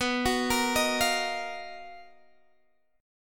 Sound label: B Major Sharp 11th, B, down, Guitar Standard; BM#11; arpeggiated; JGuitar